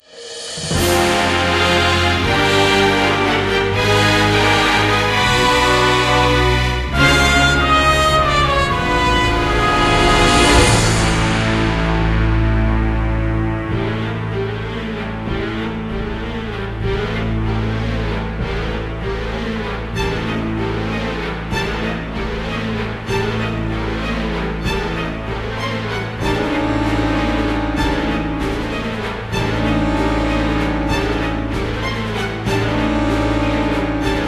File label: Transition Part2 Short No Tempo; GreyWraith